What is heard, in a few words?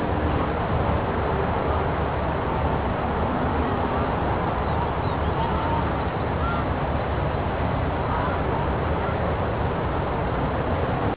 streets; cstrike